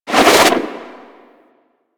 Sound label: Rifle Retract; DE; Warframe; Weapons; Sentient; Sent Rifle New War